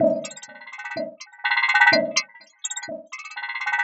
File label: synth 125 fragulat C Min; Transmission-X